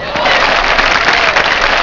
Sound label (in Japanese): kansei, cheer; Nintendo; July 2020 Gigaleak